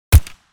Spec ops assault rifle single shot

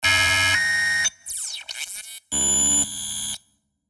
Glitch tone

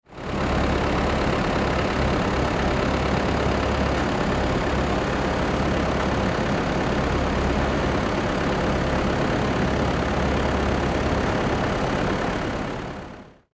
Excavator engine idle construction vehicle loop

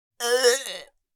Adult female retching deep groans; Puke and Vomit Sound Effects; Vomiting Sounds

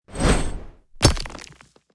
Pickaxe launch and hit

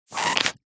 Empty gel bottle squeezing and release

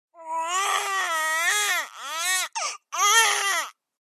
Infant crying feeling grumpy and tired

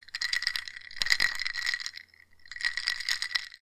Preparing magic potion mixing ingredients

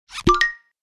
Button positive notification

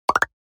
Multiple pop

